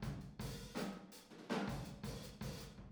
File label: Boogaloo Fill Room ST, 82 BPM, Drums; Sonic Foundry (Sony Creative Software) - Drums from the Big Room The Mixes